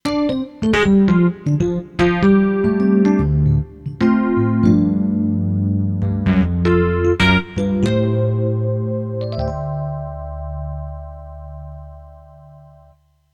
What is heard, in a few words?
Rhodes